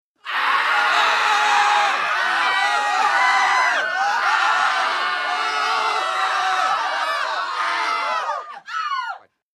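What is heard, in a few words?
Crowd Panic Aaaghs Screams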